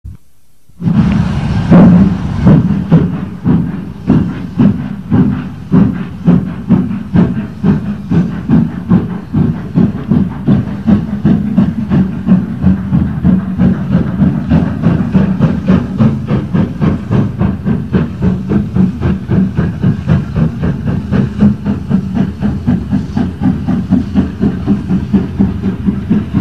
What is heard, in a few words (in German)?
Anfahrt; alsdorf